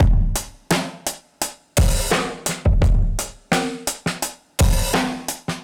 thumper, drum loops, boombap

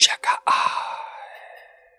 chicka ahhh, Voice; ooh ahh

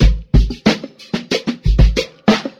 conga liners, funky drum loops

